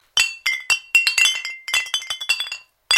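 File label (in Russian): звуки чоканья на празднике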